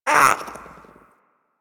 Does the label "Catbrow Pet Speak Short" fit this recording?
Yes